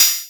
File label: KPR Hat C, Korg KPR77